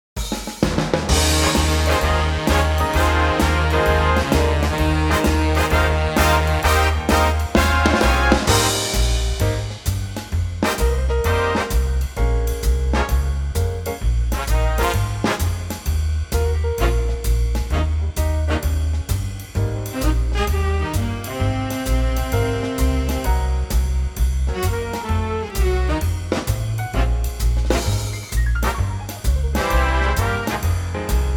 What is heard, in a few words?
Ab big band